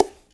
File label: BONGO; InVision Interactive; Percussion Slam; BOLERO